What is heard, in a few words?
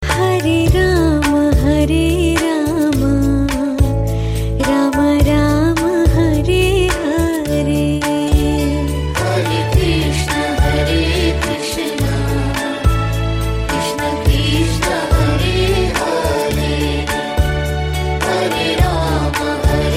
Hare Krishna Ringtone